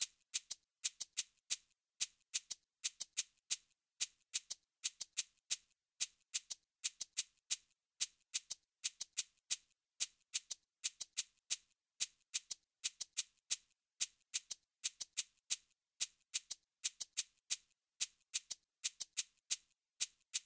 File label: abakua matanzas erikundi